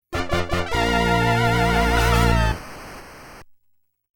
ファンファーレ (FM音源版); Xak; MSX

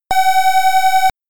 予選スタート・サイン音

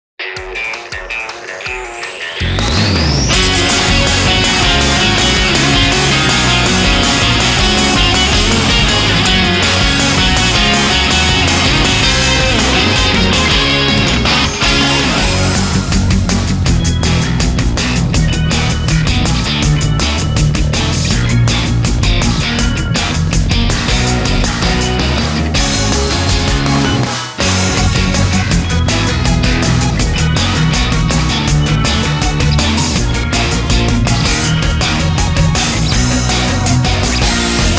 instrumental short ver

